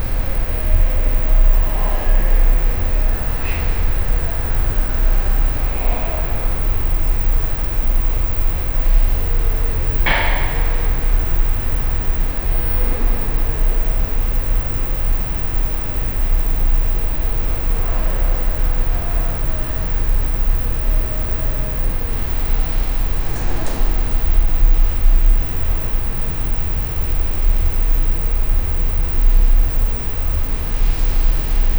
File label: ambient sound of an empty, architecture